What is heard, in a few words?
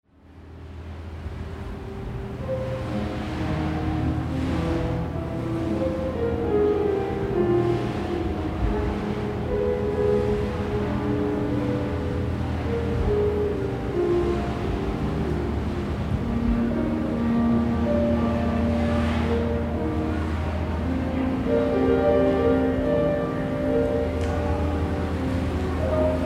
Piano; safr; Park Sr Center Piano